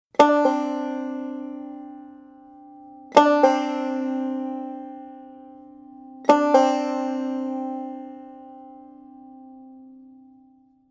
Drop Thumb Melody

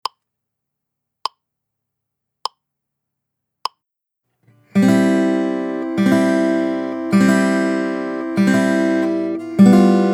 Thumb Strum

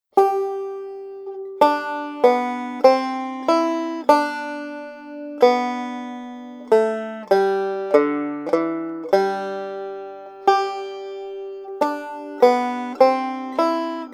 Melody

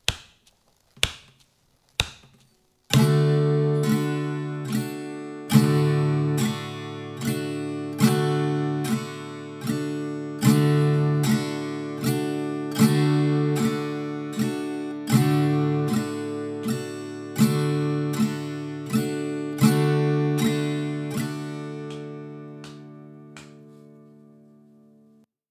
Simple Waltz Strum

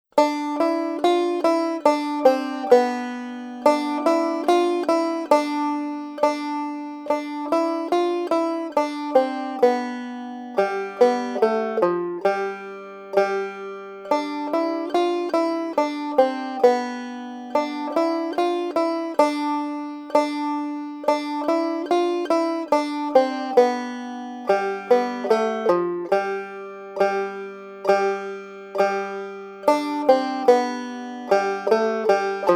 Melody

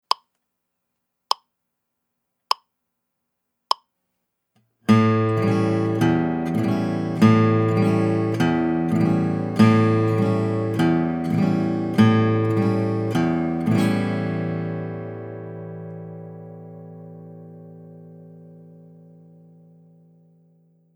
Alternating Bass Strum on A7 Chord

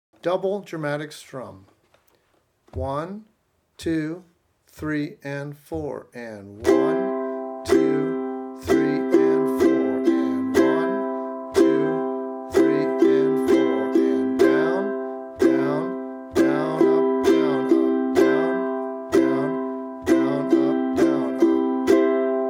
Double Dramatic Strum

